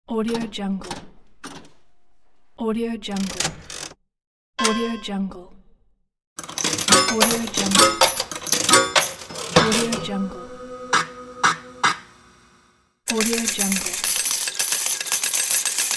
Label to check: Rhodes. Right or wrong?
Wrong